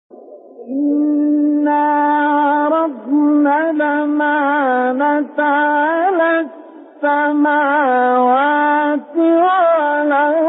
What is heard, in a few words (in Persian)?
تلاوت آیه سوره; 72; احزاب; استاد مصطفی اسماعیل